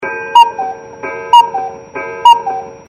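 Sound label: cuckoo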